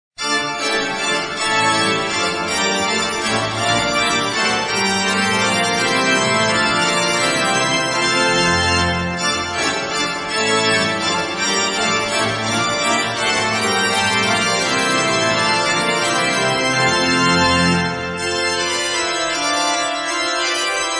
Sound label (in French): Plein jeu; Marchand